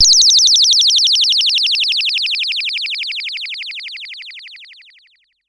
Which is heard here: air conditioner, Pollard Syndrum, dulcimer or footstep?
Pollard Syndrum